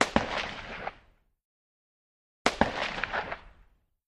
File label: Russian SKS Single, sks 7x6